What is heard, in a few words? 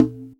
Conga; Equipped Music; Loungin House